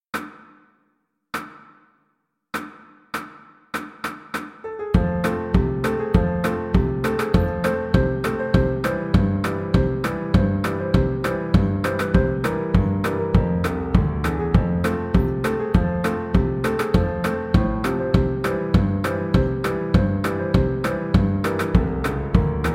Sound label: Flute